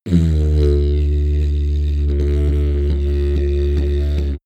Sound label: didgeridoo jump medium